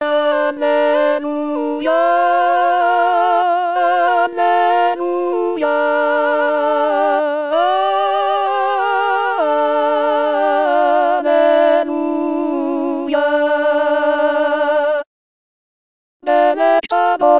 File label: Alto